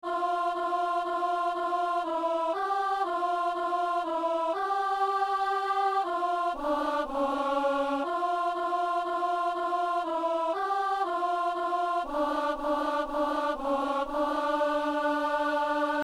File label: alto; Messe de Saint-Jean - Alleluia